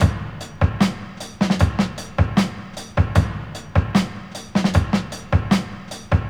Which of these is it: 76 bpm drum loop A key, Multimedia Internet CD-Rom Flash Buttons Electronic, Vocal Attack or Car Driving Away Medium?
76 bpm drum loop A key